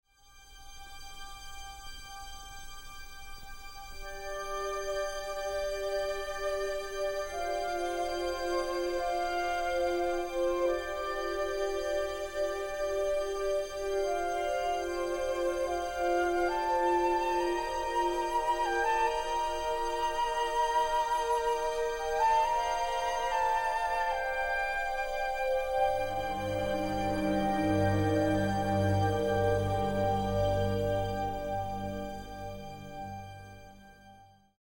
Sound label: pedale eraser